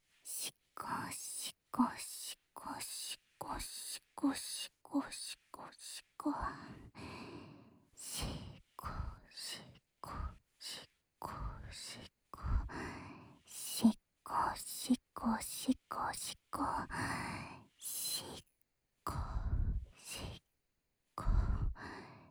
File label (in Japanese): 手コキ（しこしこ・左）（SEあり）手交